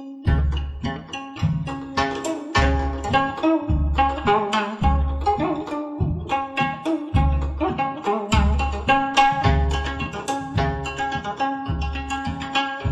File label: gayageum; Korea; Instruments